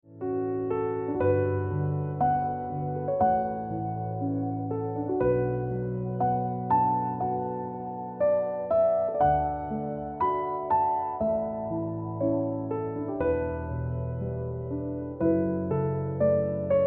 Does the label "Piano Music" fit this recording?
Yes